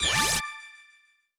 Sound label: Card Level Up